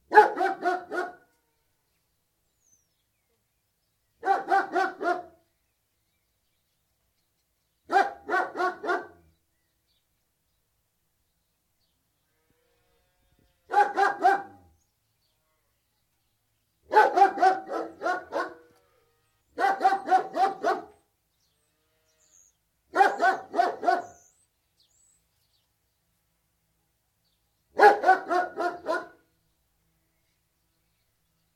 Large Alsatian barking, with some birdsong (Dog interior, microphone exterior); Environmental Sound Effects; BBC